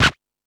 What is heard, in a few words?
House Machine, E-MU Pro-Cussion